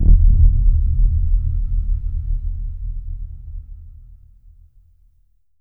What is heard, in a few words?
VFX2 Sub